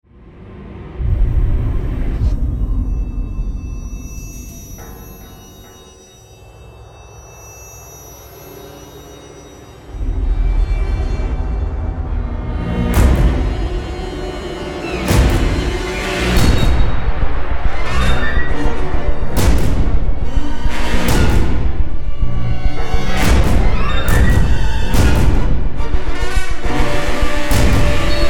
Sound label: Horror in action